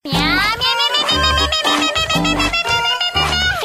miau

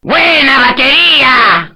bateria

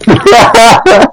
jaaa

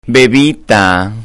bebita

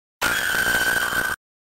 grito

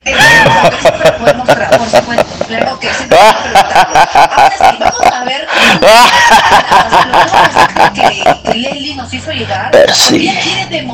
muajajaja

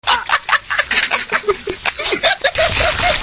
jajaja

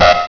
alarma

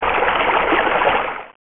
agua

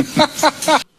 ajaja

